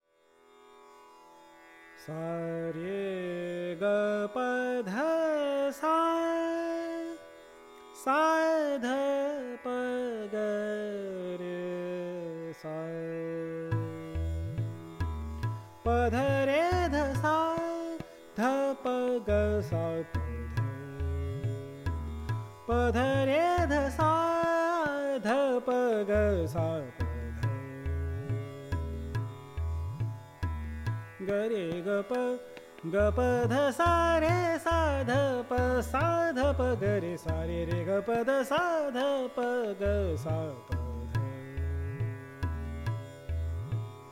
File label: Raga Jait vocal; Bansuri Student Zone